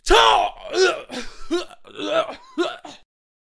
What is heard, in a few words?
Super Boxer Death Vocals